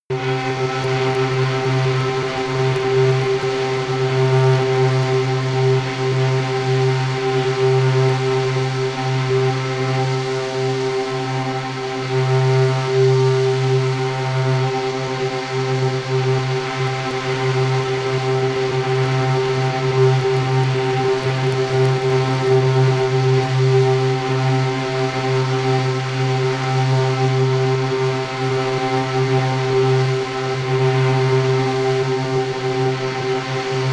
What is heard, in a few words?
drone vinyl crackling